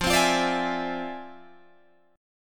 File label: F Minor Major 13th, Guitar, DADGAD